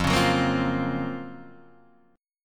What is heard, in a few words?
F sharp Major Sharp 11th F